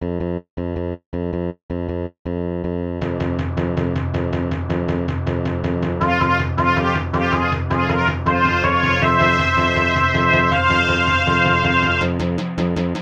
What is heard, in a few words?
Battle theme Drums of Battle; RPG